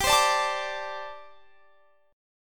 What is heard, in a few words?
G Suspended 2nd Suspended 4th G, down, Guitar, Standard; Gsus2sus4 strummed; JGuitar